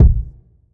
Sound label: small reverb kick sound a sharp key